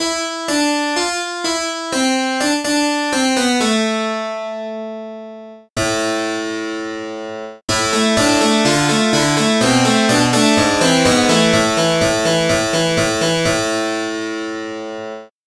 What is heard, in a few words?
harpsichord; SpectMorph Evaluation #4